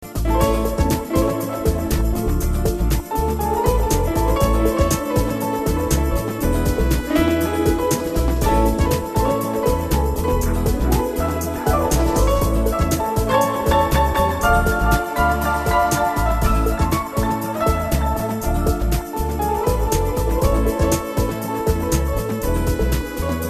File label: Latin Smooth Jazz